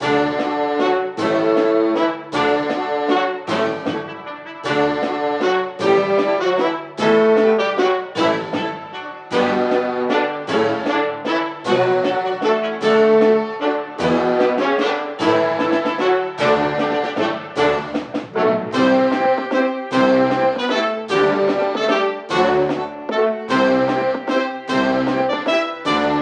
German Song (Instrumental Version); the great ace attorney chronicles

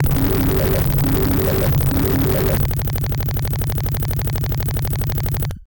Ingnition; rally bike toaplan 1 arcade

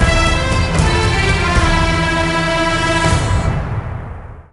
Sting Boss Lose fight; percy jackson and the olympians the lightning thief 2010 nds gamerip

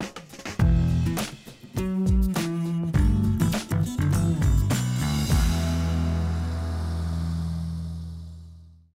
Quest Assault Win

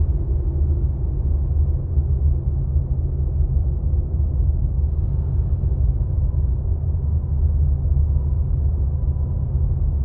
ambience - interior tunnel

